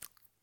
lip sound; SEXUAL sounds; ROBLOX GAME ASSETS

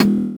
GUI Scroll Sound; ROBLOX GAME ASSETS

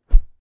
Swhoosh; Gameplay

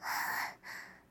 light breathing; ROBLOX GAME ASSETS

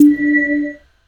GUI Swipe

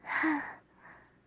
light breathing; ROBLOX GAME ASSETS

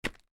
Default, Footstep

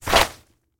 Cloth Move; Gameplay

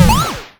Poof; Gameplay

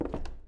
wood planks, Footstep

